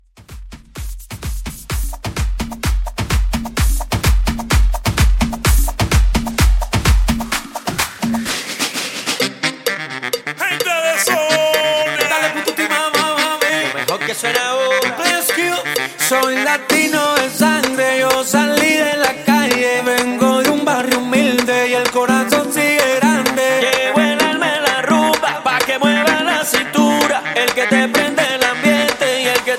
Latin Club 128

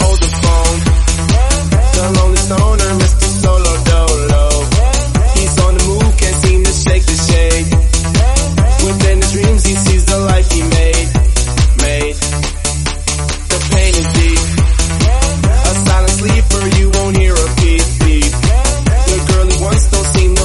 Trance 140 bpm Bm

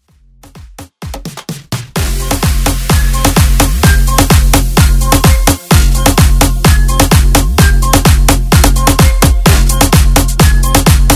Bootleg Blend

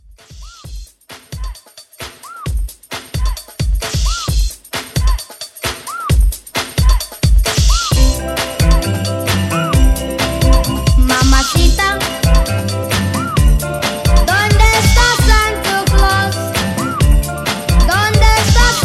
Christmas Re Drum

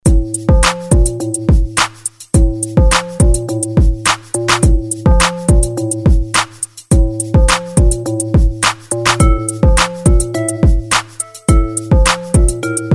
Hype Redrum

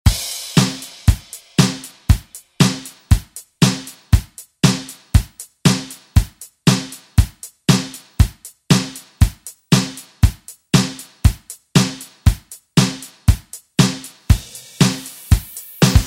80s Re Drum; DJ Mhark

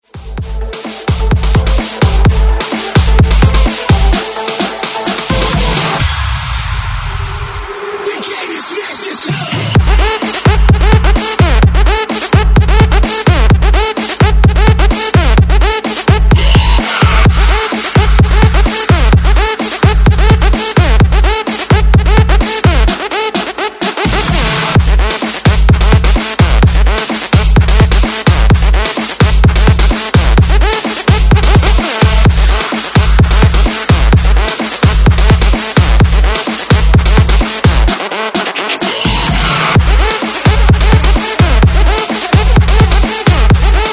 130Bpm BREAKMIX; DJDOLLS

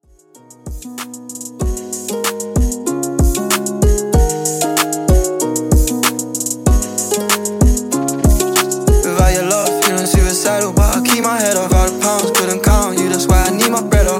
95 Bpm; Redrum

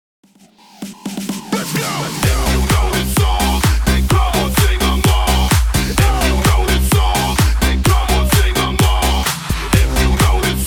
HYPE 128 BPM

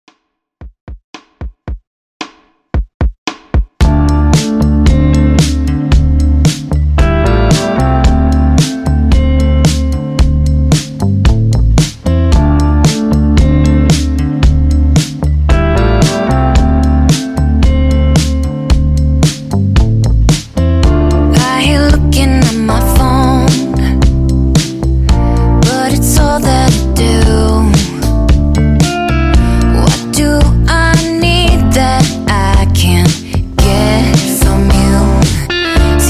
Grid Country Redrum 113Bpm

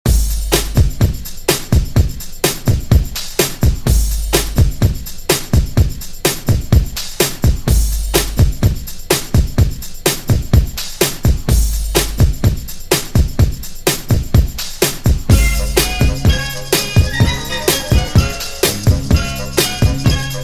Motown, 126 Bpm; Redrum

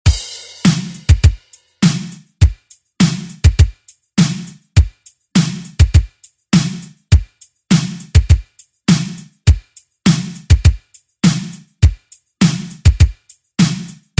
80s Re Drum; DJ Mhark

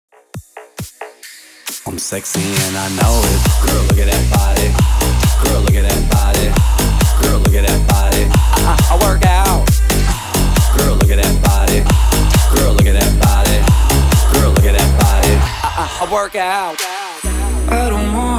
DANCEHALL 135 BPM

